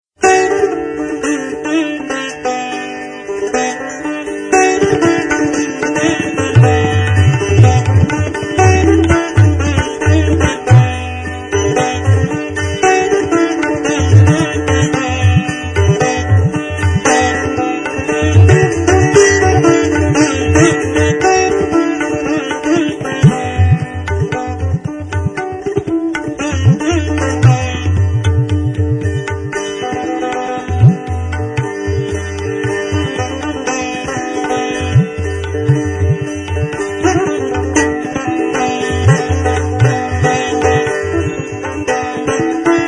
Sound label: Indian Sitar Musician Raag